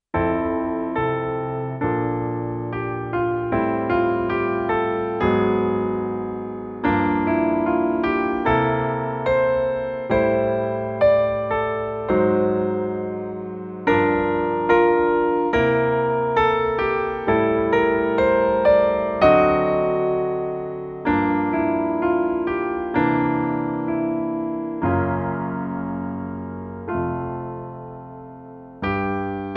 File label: Ballad